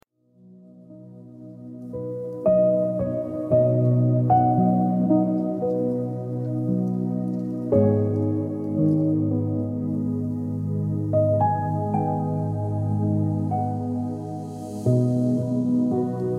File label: hold music; livekit